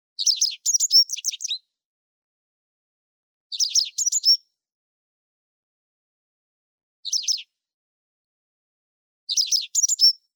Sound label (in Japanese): segurosekirei